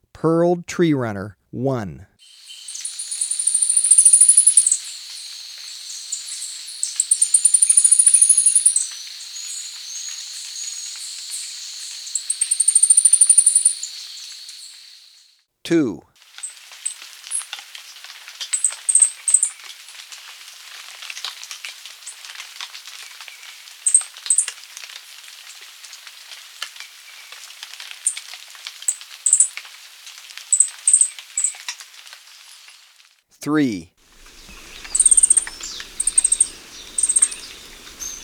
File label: Pearled Treerunner, Birds of Ecuador; S. America